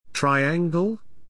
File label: triangle